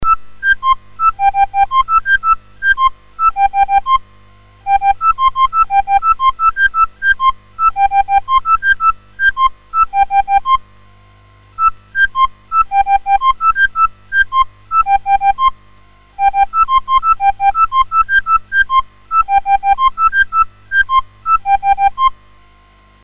MOS6581R3, GAMES

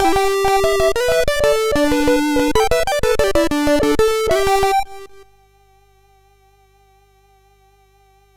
Game Music, sid MOS6581R3

